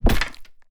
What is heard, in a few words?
pike attack